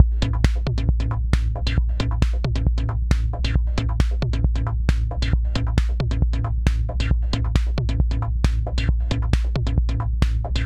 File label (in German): Kompressor Vergleich Softube Tube Tech CL 1B mk2